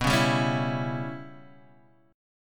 C sharp Minor 7th Flat 5th B

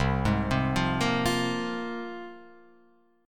C 7th Suspended 4th Sharp 5th, Guitar, Open F; C7sus4#5 arpeggiated

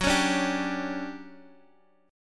D Major 11th F sharp, chord

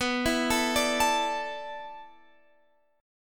D Suspended 2nd B; Dsus2/B; arpeggiated

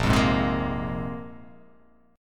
A sharp 7th Suspended 2nd, guitar chord

